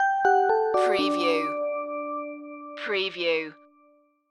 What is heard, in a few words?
idents